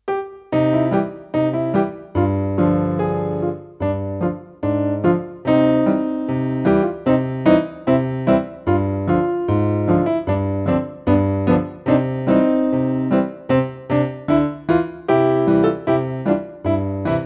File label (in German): Kuckuck Original